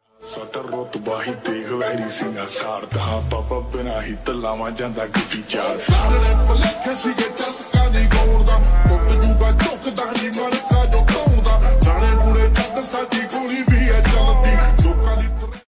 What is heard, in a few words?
punjabi song ringtone